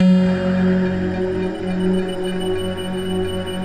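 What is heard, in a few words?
mystery pad, Stack; Optical Media International; Sonic Images Library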